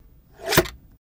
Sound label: Paper Cut Slice, papercutter